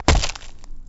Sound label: Mountain Rock Light Med, shagov